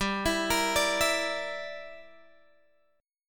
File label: G Minor 6th, Guitar Drop D